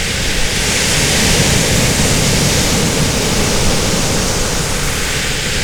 Ocean, noise; Logic Pro X; Alchemy; WaveNoise

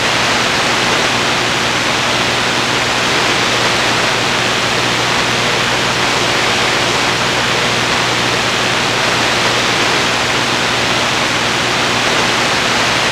Radio, Noise; Logic Pro X; Alchemy; WaveNoise

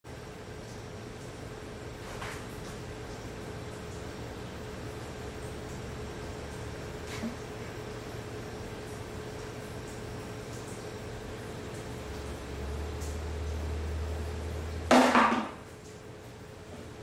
cigar box corkscrew

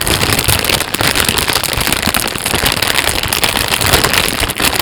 Crinkle, noise; Logic Pro X; Alchemy; WaveNoise

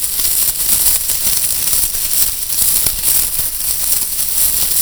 Straw, Noise; Logic Pro X; Alchemy; WaveNoise

